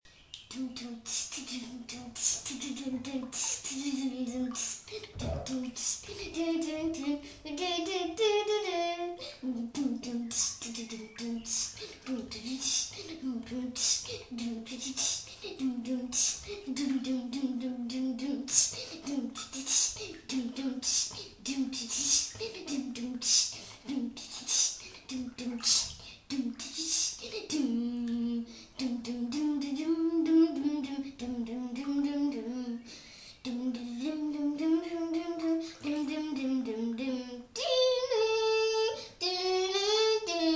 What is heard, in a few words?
beatbox